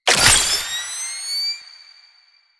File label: Laser Flare Activate; Warframe; Lasrian; Lasrian Soldiers; Abilities; Prone Lancers